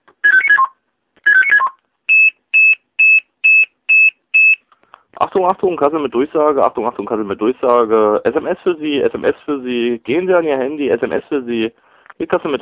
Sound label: Sms; Leitstelle